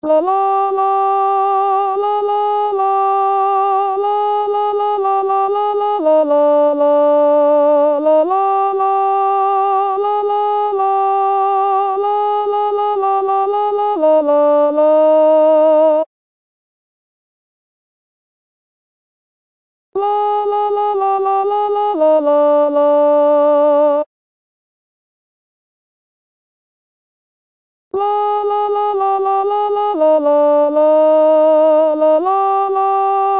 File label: free at last alto